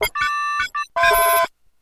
Computer Beeps

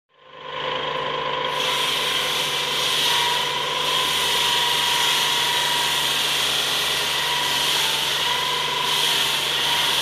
Construction

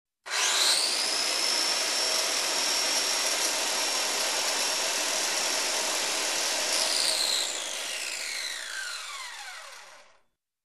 Electric Cutter Cutting Metal

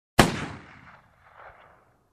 Gun shot sound

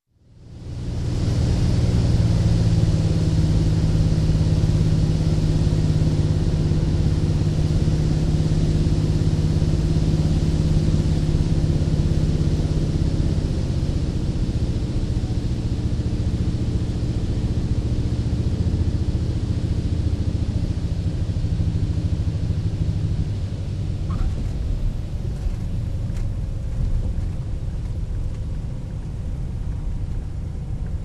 Propeller Aircraft landing touchdown from cockpit

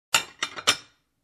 Grabbing saucer

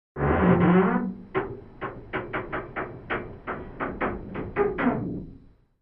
Wooden Door Creak

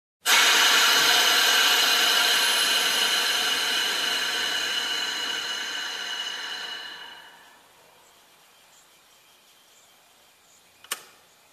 Electric Cutter noise gradually slowing down

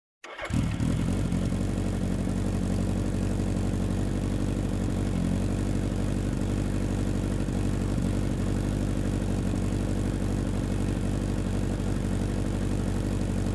Motorcycle engine sound

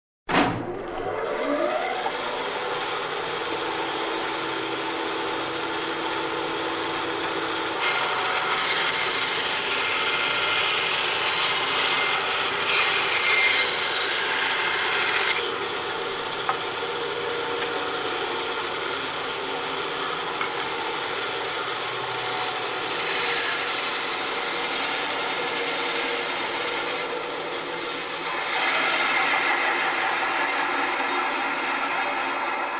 Cutting Machine Turned on and Running

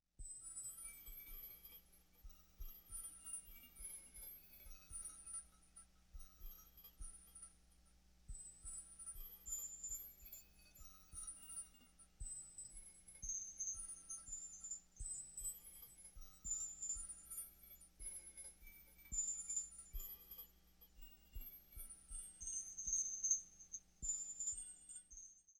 Tinkle sound up-close

